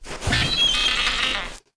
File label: BRUTAL PEACE IDLE